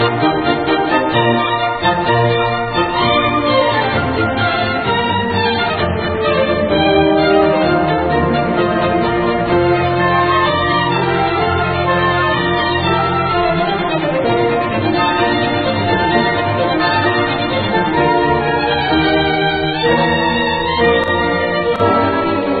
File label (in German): drm klassik; Klassik mit 11kBit/s; DRM-Empfängers für 3995 kHz